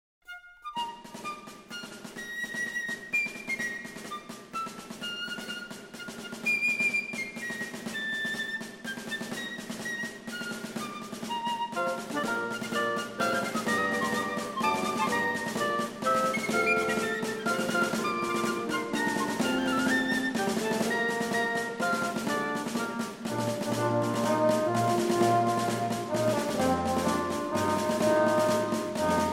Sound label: The Star Spangled Banner (Instrument, English Chamber Orchestra; Instrumental